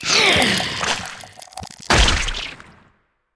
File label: hexscyther dead, monster